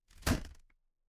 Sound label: impact slam